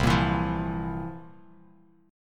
C 11th, C, down, Bass Standard; strummed; chord; JGuitar

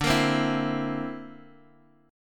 D 7th Suspended 2nd Sharp 5th, chord; D7sus2#5 strummed

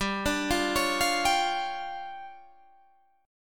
D Minor Major 7th Double Flat 5th, G

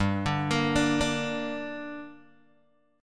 G Minor G down Guitar Open G, chord

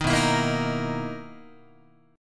B-Major 11th Guitar, BM11 chord; strummed; JGuitar

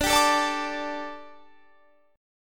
D Suspended 4th

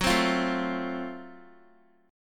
Gb Minor Major 7th Flat 5th Gb Guitar; strummed; x,9,12,10,9,x; JGuitar

